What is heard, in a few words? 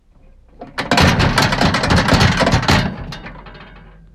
Smash Metal Gate